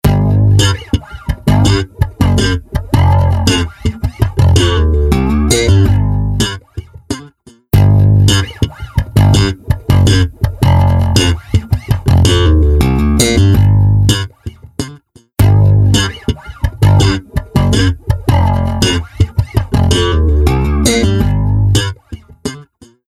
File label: instant flanger mk ii